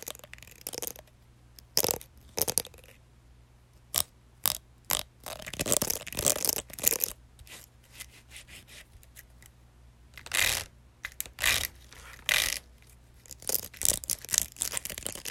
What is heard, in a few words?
Hair brush